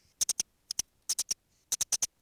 Mustela nivalis

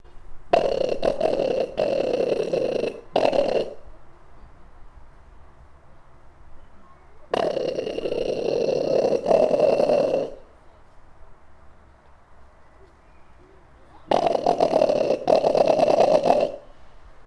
Phoca vitulina male; Harbor seal; Vocal display of a male before the mating; When calling, the male puts out of water only the tip of his muzzle

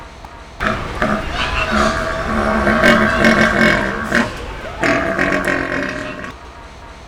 Elaphurus davidianus male; Pere David's deer; Calls of a harem stag during the rut

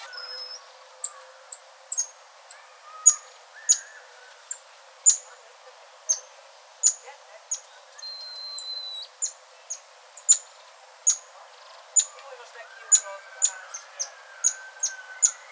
Leontopithecus rosalia; Golden lion tamarin; The soliciting calls toward a keeper, standing in the enclosure

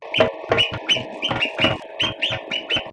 Rhombomys opimus alarm; Great gerbil; Alarm calls, accompanied with a podophony (paw strikes on the ground)

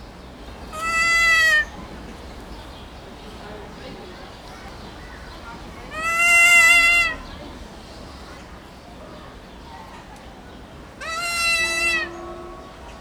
Nestor notabilis; Kea; Prolonged calls of a disturbed bird

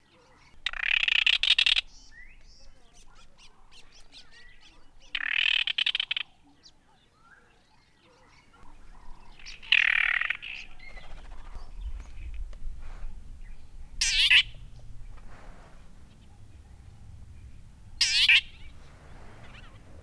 Laniarius atrococcineus calls; Crimson-breasted shrike; Various loud calls of the Crimson-breasted shrike

